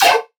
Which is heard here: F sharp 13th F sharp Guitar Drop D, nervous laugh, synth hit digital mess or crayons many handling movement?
synth hit digital mess